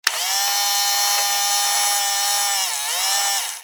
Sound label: RC truck electric motor whirring; rc toys